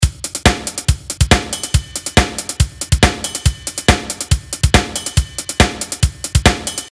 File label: Drum Loop